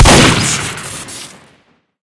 shotgun shoot crit; tf

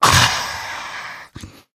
death scream, survivor voice; source engine; left 4 dead 2; manager

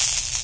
new spark, energy; video games; ambient; source engine; portal2

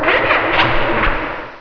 boid alert; source engine; hl1; video games

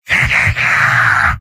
soldier battle cry; mvm

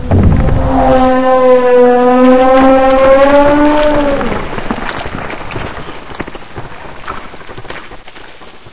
die; hl1; gonarch; Source engine

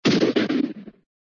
kablam!; cartoon; hannah barbera cartoon sound fx

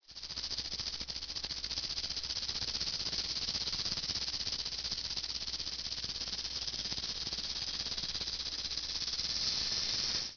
snake, animal; ambient; Source Engine; cstrike

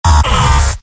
pain sharp; scout; source engine; tf; mvm

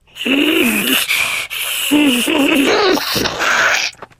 spitter lurk, voice idle; source engine; left 4 dead 2

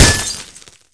bottle broken hit world, weapons; tf

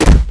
vort foot; npc; source engine; ep2

